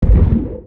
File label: flak weapon fire; weapons; Stellaris